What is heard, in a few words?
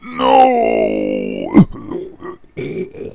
death